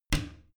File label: bathroom cabinet creak close heavy; objekte; tueren